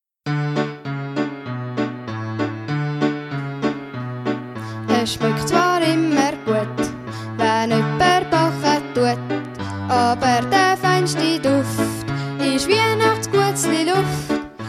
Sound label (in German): mit Gesang D-Dur